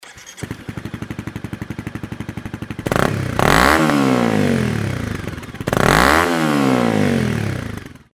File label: crf250rally